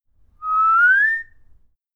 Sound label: changing tone